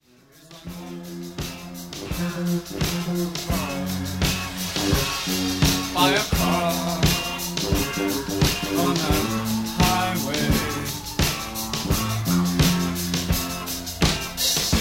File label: electric guitar